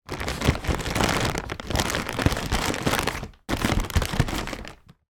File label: household paper lamp shade movement